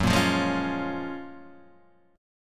Gb Major 9th, down, Guitar, Half Step Down

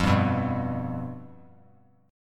F Suspended 2nd Flat 5th, Bass Standard; strummed; 13,10,9,0; JGuitar

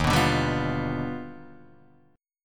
D sharp Augmented Major 9th D sharp Guitar Drop D

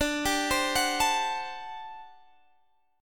G Major 7th Suspended 2nd Suspended 4th D

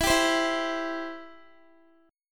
E Minor Sharp 5th, Guitar, 4F,4A,5C,5G,5C,6E; strummed; x,19,19,17,16,x

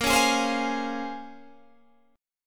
Bb Minor 9th Bb, Guitar, Standard; Bbm9; strummed; 18,16,18,17,18,16; JGuitar

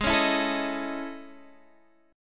A Major Sharp 11th, G sharp

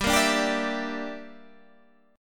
G Minor 6th, Guitar Standard; Gm6 strummed; JGuitar

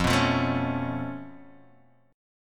Db Augmented 7th F

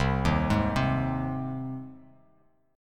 C Minor Sharp 5th, chord; arpeggiated; JGuitar

